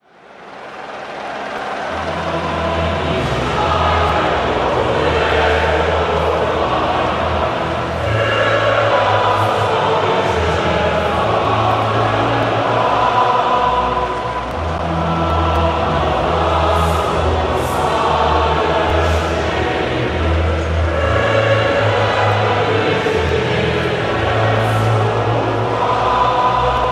German National Anthem; EURO 2016

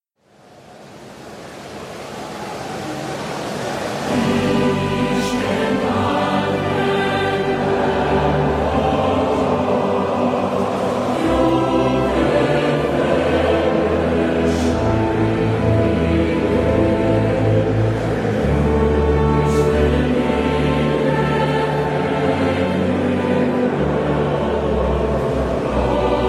Hungary National Anthem; EURO 2016

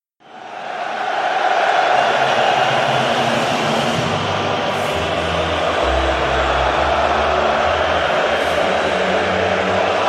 England National Anthem; EURO 2016

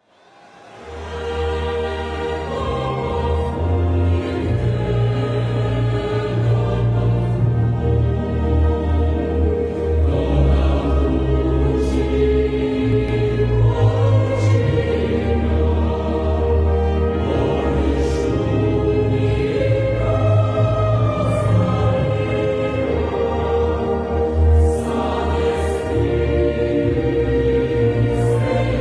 Czech National Anthem; EURO 2016

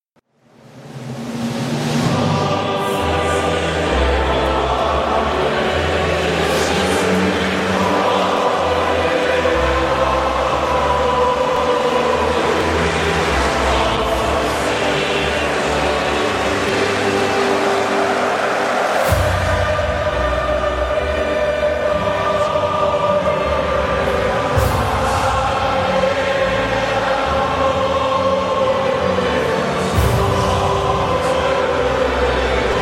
Northern Ireland National Anhem; EURO 2016

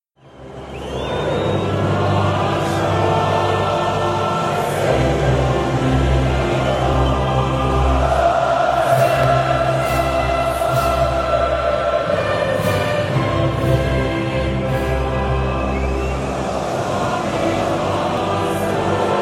Croatian National Anthem; EURO 2016

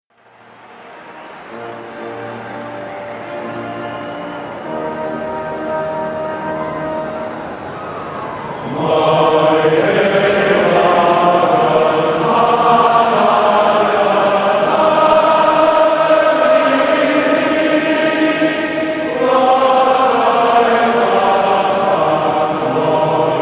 anthem

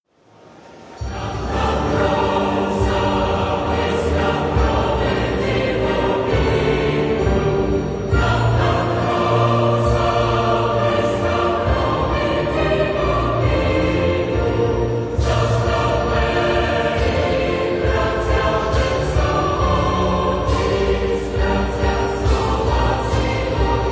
Slovakia National Anthem; EURO 2016

